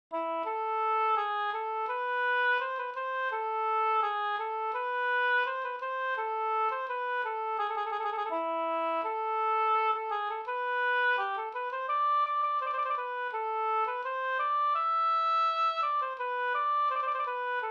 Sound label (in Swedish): ganglat A moll; Peltoniemen Hintriikan surumarssi fi